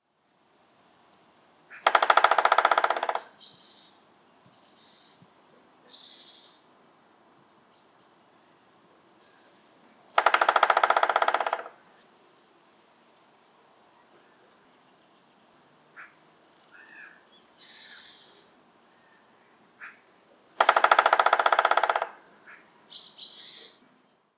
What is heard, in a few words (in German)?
Schwarzspecht Dryocopus martius; Birdwatching; Lausche dem Schwarzspecht